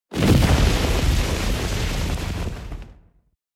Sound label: fire